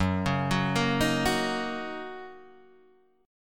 Fsharp 6th down Guitar Open D

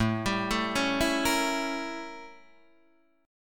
A 7th Flat 9th Guitar Open D

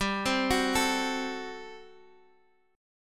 G Major 7th Suspended 2nd Suspended 4th G; x,x,x,x,7,7,8,6; down

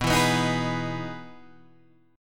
E Major 7th B, chord

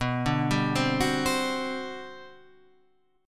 G Major 11th B

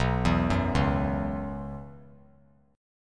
B Suspended 2nd Suspended 4th; arpeggiated; JGuitar

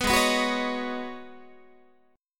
G sharp Minor 11th G sharp Guitar, chord; G#m11 strummed; 14,16,14,16,17,14

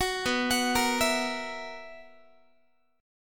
B Major 7th Suspended 4th B down Guitar; arpeggiated; 21,19,21,20,20,x

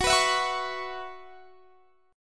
F sharp 7th Suspended 4th, Guitar, Standard; F#7sus4 strummed

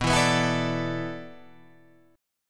D 6th Suspended 2nd B, chord; D6sus2/B strummed

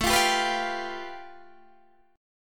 A 7th Suspended 2nd Sharp 5th, Guitar, Open D

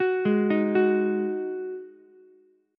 D sharp Minor Double Flat 5th, Ukulele; arpeggiated; chord; 11,8,11,9

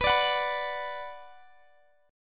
B Minor, Guitar, Open D

